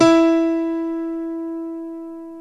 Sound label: DBL GRAND; OMI - Universe of Sounds